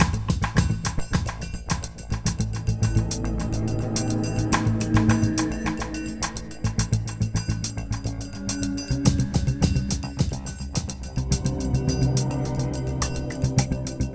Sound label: Stealth Loop